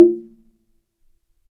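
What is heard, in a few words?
Slit Drums, soft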